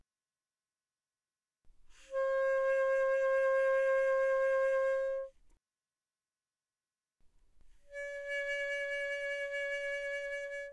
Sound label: Alto Sax Vib pp C5 Ab5, alto saxophone